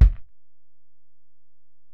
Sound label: Ac BD, Alesis DM-PRO; 300 Drum Machines